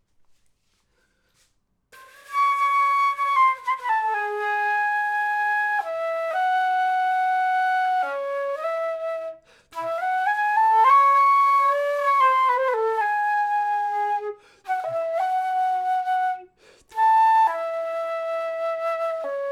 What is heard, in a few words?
flute intro; logic